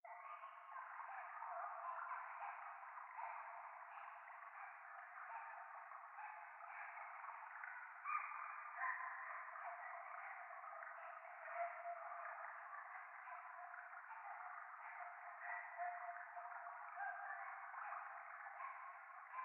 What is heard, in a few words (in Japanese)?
廃墟 可愛い喘ぎ 川のせせらぎ